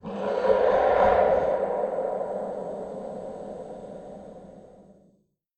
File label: Mountain Guardian New Attack Vox DISTANT; Journey